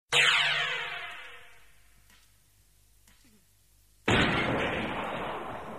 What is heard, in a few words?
Space Shoot